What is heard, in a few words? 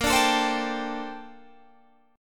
A sharp Major 13th, Guitar Standard; 18,17,19,17,20,17; A#M13 strummed; capo=3